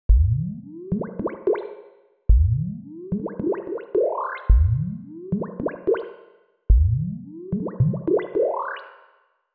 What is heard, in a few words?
Sci-Fi Bubbles, Roland JU-06; sfx; SoundDiviner Project; Roland JU-06 Pack09; DigiD sfx pack